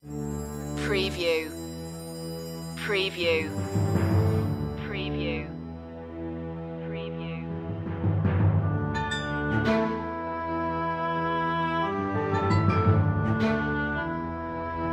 battle slow

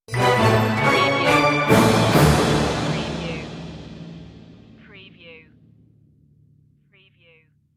deck the halls orchestral stinger; media music now

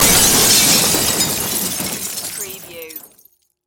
Crash Wood Glass

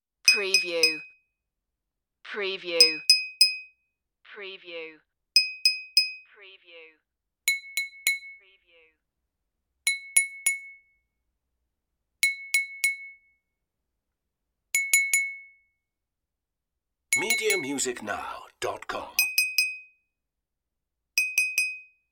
propose a toast clinks

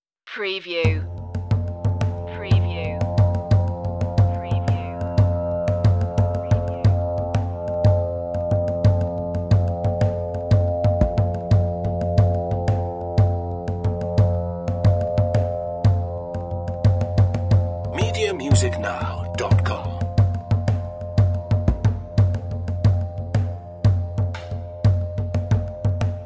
battle cry

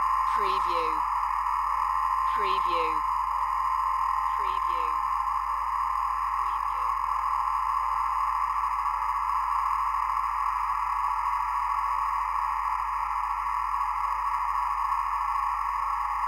Science Lab loop

